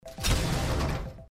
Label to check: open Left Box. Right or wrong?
Right